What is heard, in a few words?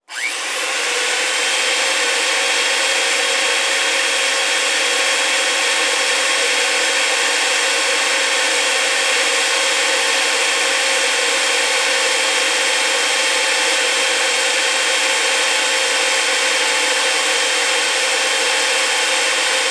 Tools and machines Pipe Threader